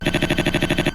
dialogue sound

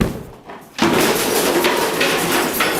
breaking

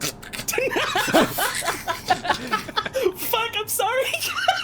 laugh track

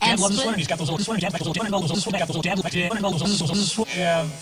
noises

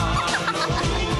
laugh

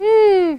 mmmmm; Sonic 06

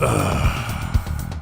ugh

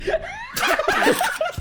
laugh track

